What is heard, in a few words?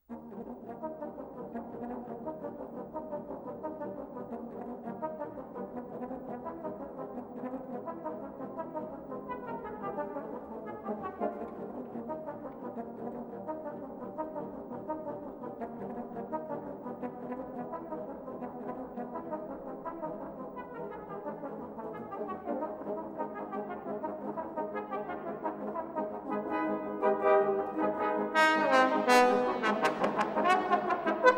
Galop, Trombone; Josephs; Eight Aphorisms